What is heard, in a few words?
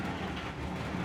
bathroom sliding glass shower door open